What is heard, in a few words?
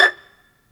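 PIZZ VLN E5, violin; AKAI S-Series CD-ROM Sound Library VOL-2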